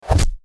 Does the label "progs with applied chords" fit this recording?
No